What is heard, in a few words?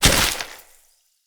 Grunt Death Cabbage Growth; Enemy; Corrupted Sproutfolk; Combat